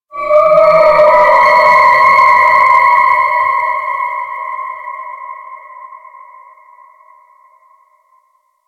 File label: Space Exterior Dist Call, Space Battles; Ambience; Warframe